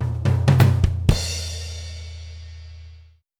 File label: 120 CARIBE